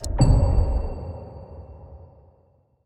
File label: Quest Finished; Custom UI Sounds; Dying Light 2 Stay Human